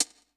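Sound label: closed hi hat, 135 BPM; Ueberschall; Drum & Bass Resonance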